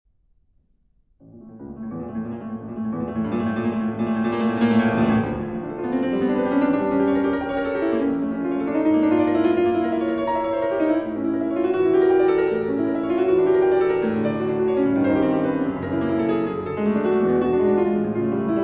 Violin Sonata in A Major, Allegro

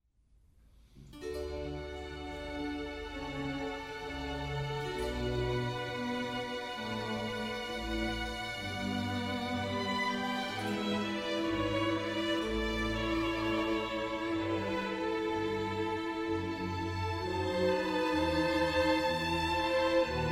Orchestral Suite No. 3 In D Major, Air

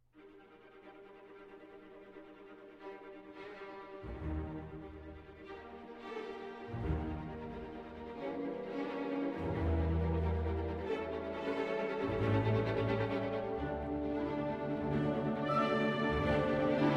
Violin Concerto in G Minor; Violin Concerto No. 1; Op. 26; Bruch

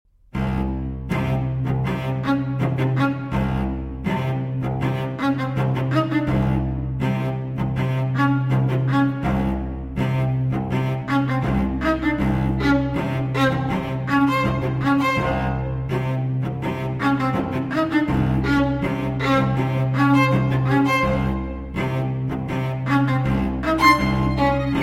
String Quartet; No. 1 Pictures From Warsaw V. Uprising

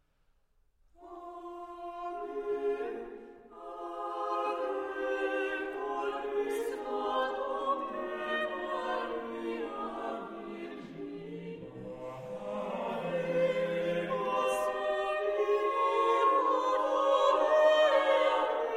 Ave verum corpus, Arranged For Choir; Fauré; Denis Rougier; Op. 65, No. 1

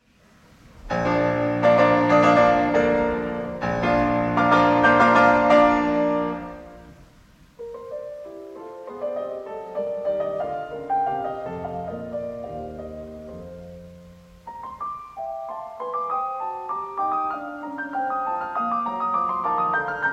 Piano Sonata No. 29 in B-Flat Major, Allegro; Op. 106; Hammerklavier

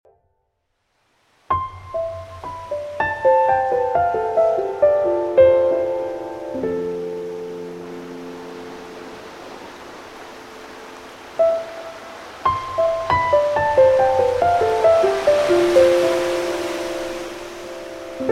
Piano & Sea

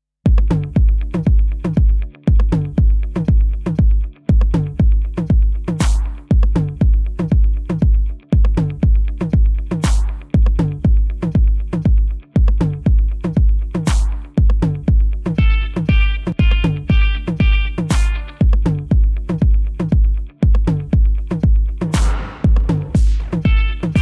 Instrumental

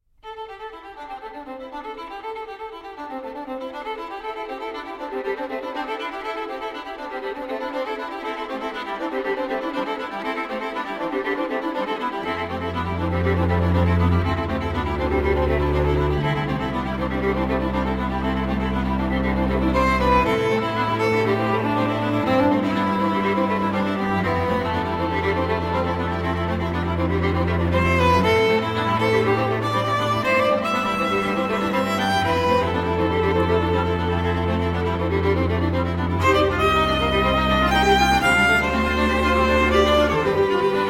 String Quartet; No. 1 Atomizations I. Interference

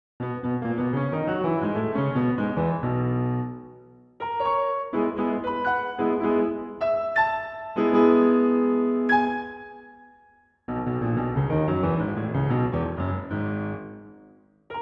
Rigoletto, Arr. Solo Piano, Paraphrase; Liszt

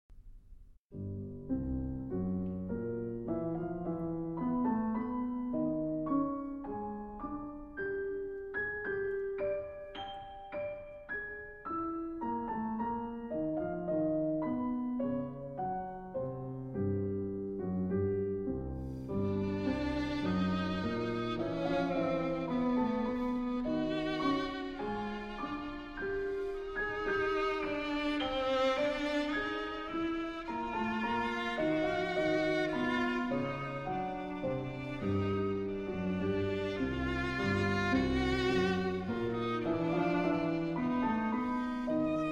Sonata For Violin and Piano Andante

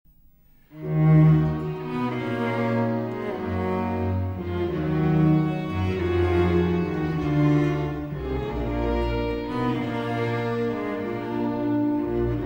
Cello Concerto in G Major, Largo; RV 414; Vivaldi

